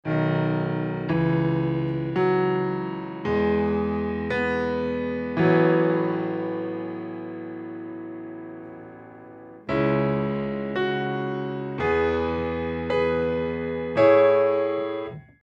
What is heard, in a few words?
Piano